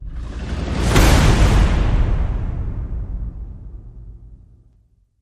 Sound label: Hard Roll